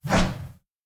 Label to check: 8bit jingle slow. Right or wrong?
Wrong